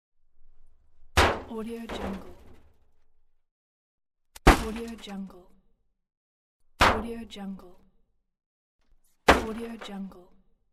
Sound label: body car breaking